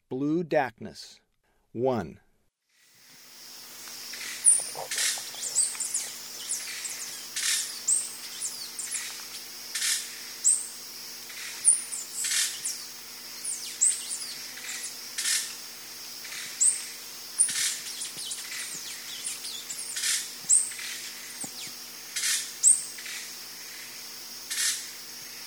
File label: Blue Dacnis; S. America; Eastern Lowlands